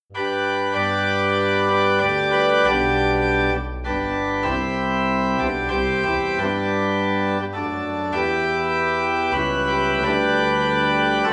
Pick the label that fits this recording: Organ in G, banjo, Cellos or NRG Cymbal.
Organ in G